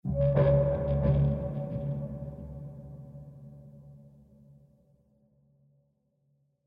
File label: Lap Steel Rhythmic; ML Lap Steel Rhythmic Mood 1